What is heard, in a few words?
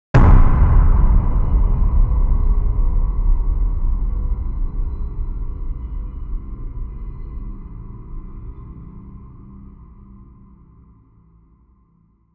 Jumpscare, Horror; Horror Sound Pack